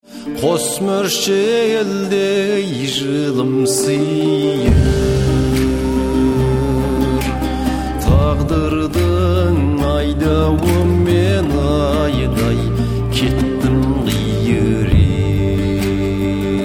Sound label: mountain wind